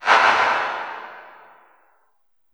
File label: LH C909, REVRB LARGE HALL; TR 909